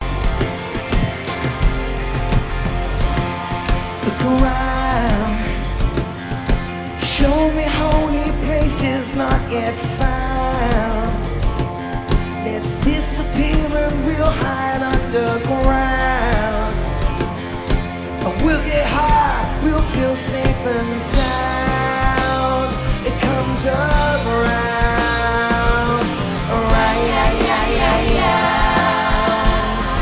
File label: Soul Singing